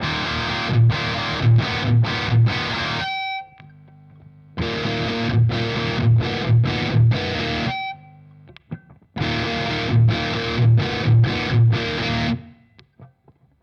Schecter Omen Elite 7 Through the pickups

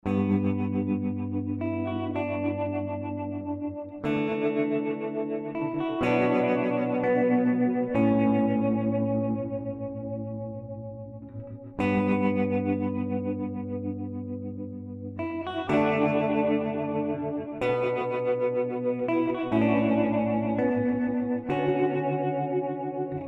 Reverb Tremble; Specular Tempus

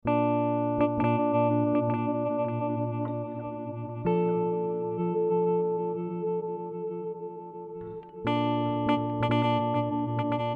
Particle Reverse Random

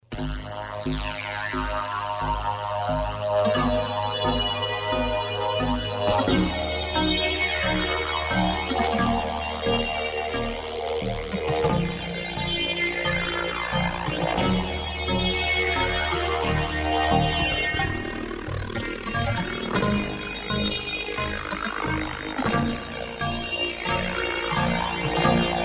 Repeating

